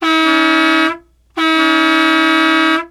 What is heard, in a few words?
Bus Horns; Cars & Trucks; Vehicles